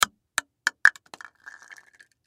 Aerosol Can Lid Drop Bounce; Drops; Foley

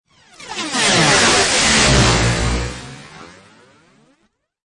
Scifi Production Element Phazer Beam

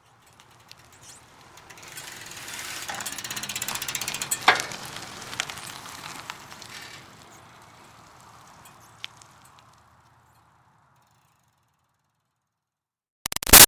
Bicycle Retro Cruiser By Slow Pedaling; Vehicles; Bicycles; BigRoomSound

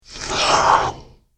Monster Warning Snarl; Andrew Fleming

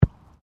SOCCER BALL BOUNCE GRASS; Soccer ball sounds; Sports

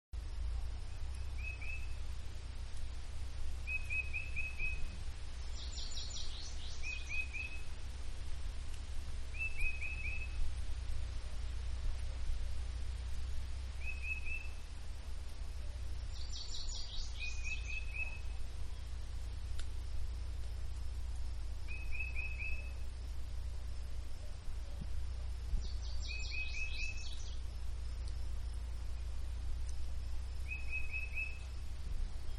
Woods-Periodic Bird Song, Etc-Quieter; Outdoors; Backgrounds; Manhattan Production Music

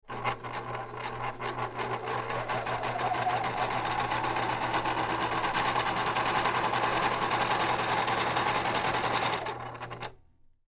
Sewing Machine, Sewing Machine On Run Off; Appliance; Household; Blastwave

